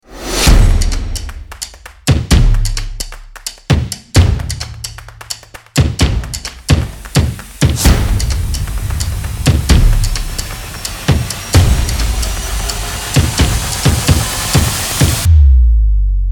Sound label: stomping drums rhyth